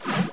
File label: sword; amphetamine